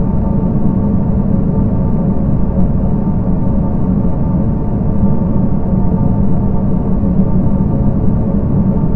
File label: city lp, ambience